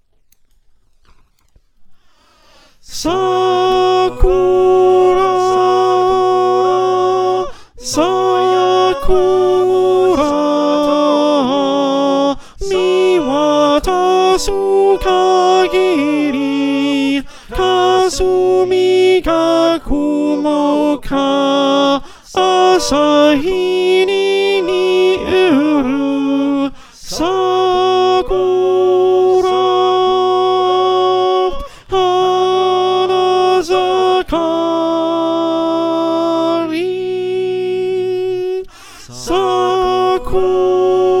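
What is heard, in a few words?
Alto, choir; Sakura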